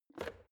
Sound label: plastic water boiler lid off